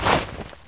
Little Fighter Jump

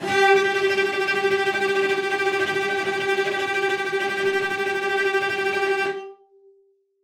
Cello Section Tremolo F G3

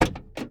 Boot Open Inside

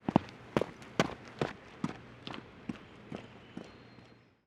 Footsteps Outside Medium Fast Foley

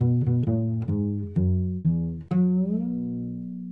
Cello Bass Strut

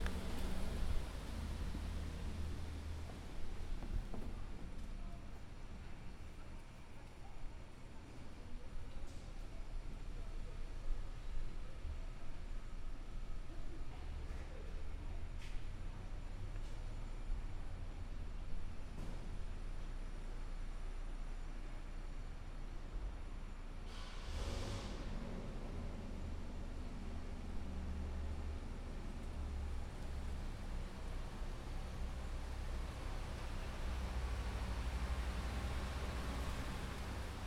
Parking garage

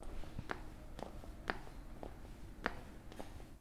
Steps Close

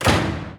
Car Gear Shift Change Up Down Sound

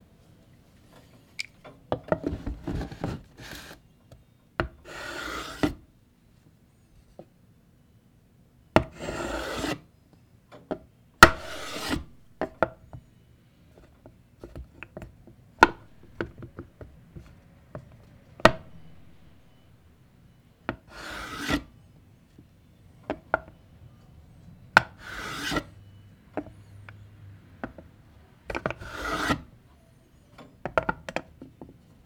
Metal Object Sliding On Wood Surface

